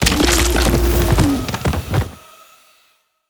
Great Boar Death

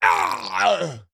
Death

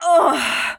Sky Queen Death

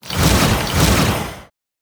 Hydra Attack

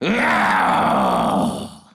Doppelganger Death

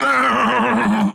Desert Pilgrim Death

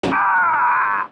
Veteran Death

